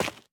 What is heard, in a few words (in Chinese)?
滴水石 踩踏声